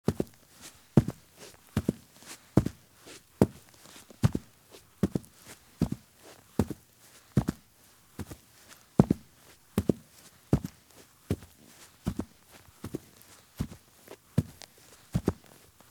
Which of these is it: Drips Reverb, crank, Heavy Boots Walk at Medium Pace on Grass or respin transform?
Heavy Boots Walk at Medium Pace on Grass